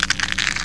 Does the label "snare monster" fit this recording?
No